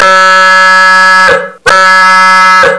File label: bullhorn sound; toeters